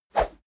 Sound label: whoosh small soft